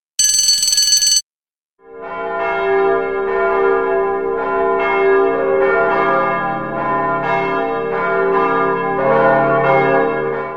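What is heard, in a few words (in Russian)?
церковный колокол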